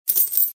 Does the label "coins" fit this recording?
Yes